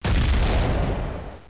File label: explosi; davidson